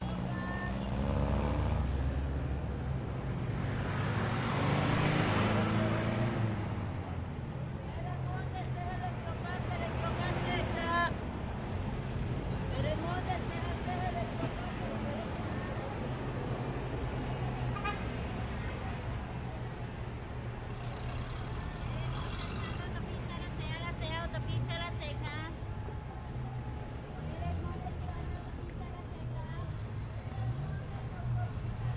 street traffic honking motorcycle walla people chatting; la paz; your free sounds